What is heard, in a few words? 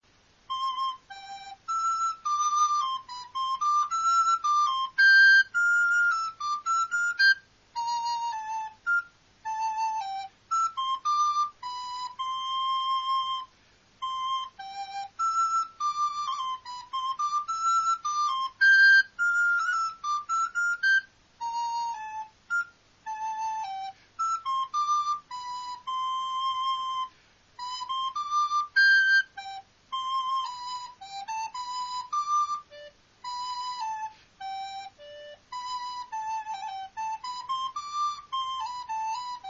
minuet, recorders